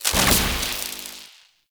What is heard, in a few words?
Shock Enemy Impact